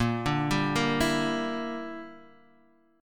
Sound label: Bb 6th Flat 5th Bb, Guitar DADGAD